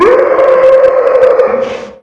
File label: chase; slender; trypophobia